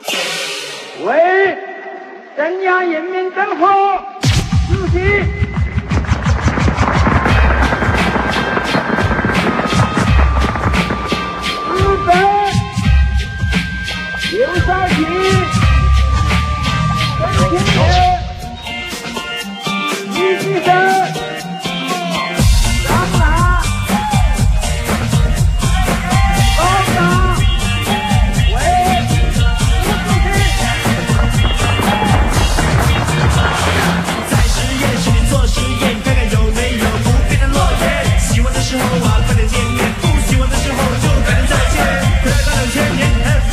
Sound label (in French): Chanson chinoise